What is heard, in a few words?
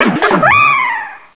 Cry; Pokemon Essentials; Pokemon Iberia V1.03